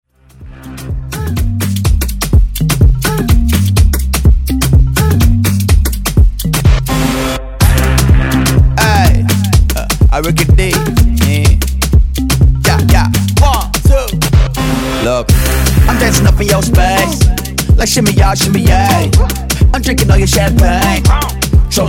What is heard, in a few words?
Hiphop